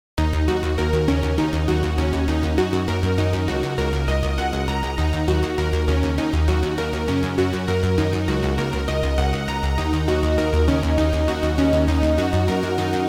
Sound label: synthwave study; weeklybeats 2026